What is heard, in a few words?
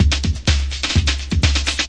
126 bpm, machine roller, drum loops and breaks